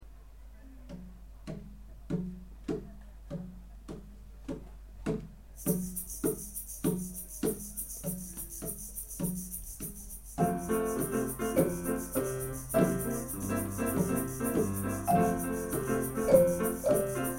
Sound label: samba lele